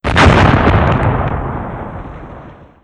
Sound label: nuke; SuperPower 2 Redux V7A